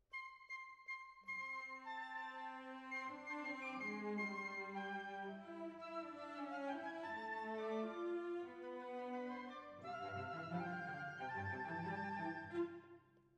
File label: Violas, jazz for piano